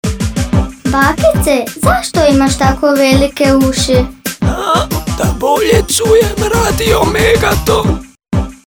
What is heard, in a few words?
JINGLE; CRVENKAPICA I VUK